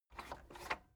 cassette eject click slow

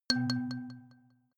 notification kalimba delay low

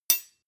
sword hit clack soft

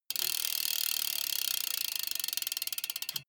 bmx bike freewheel push and slow down short

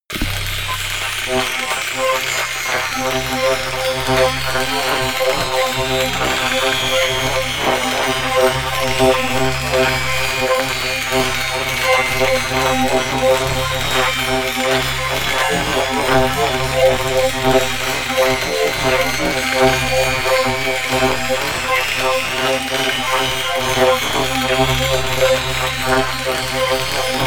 atmosphere crazy didgeridoo

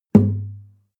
nylon guitar drum single tonal bass

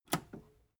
metal button robust push disable

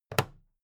plastic clasp close regular

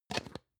plastic can small lid close medium

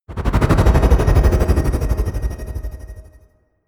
whoosh flutter

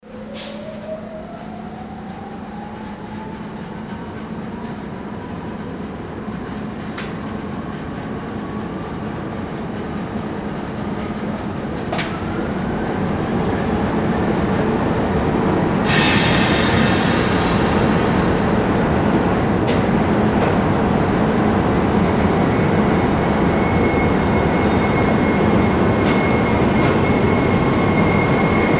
train engine start leave

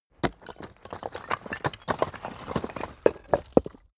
brick drop long

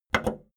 video tape player plastic play click fast

